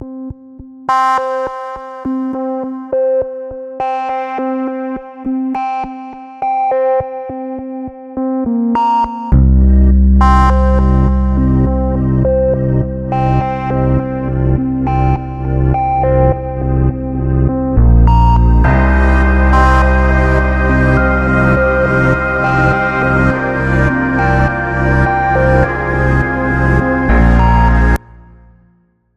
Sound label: Siren Sound, No Percussion